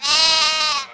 SHEEP; animal fun

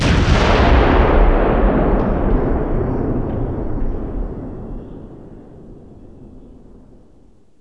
weapon

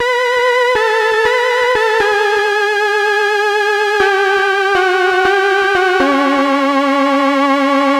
synth; widdagroovebox

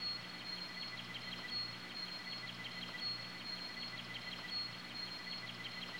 AMBNT 160; Ambient

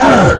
pain, player; QUAKE2; cyborg

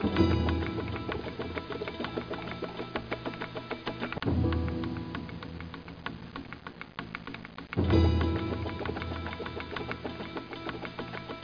jig

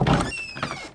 door; DAoC; Toa

